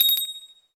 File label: Christmas Bell Collision; fowl; Christmas Bell Blocks